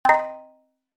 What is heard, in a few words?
finished task